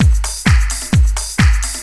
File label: dr t 130 trance 2